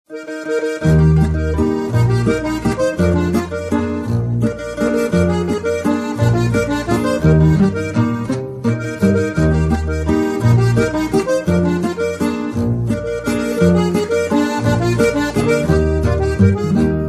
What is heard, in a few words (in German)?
walzer